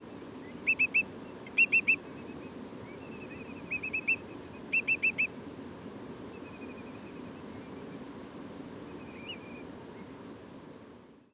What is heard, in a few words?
Whimbrels lots of piping; Portland